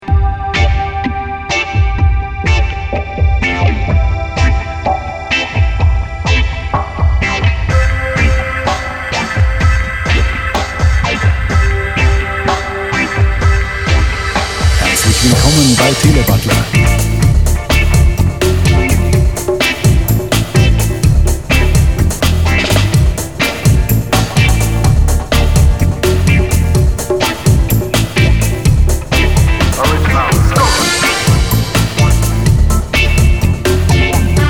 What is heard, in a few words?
cool reggae